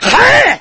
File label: fire